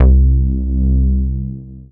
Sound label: Single Electric Basses, Dance Floor Bass